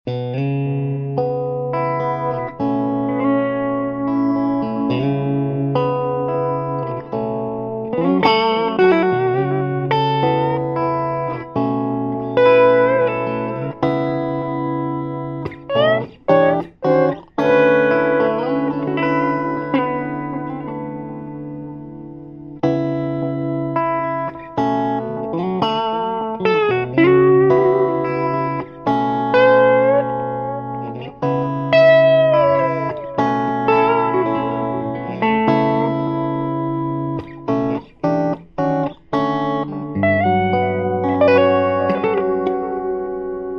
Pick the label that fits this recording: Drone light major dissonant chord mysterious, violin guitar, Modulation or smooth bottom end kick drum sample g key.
Modulation